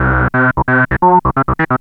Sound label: ARP Odyssey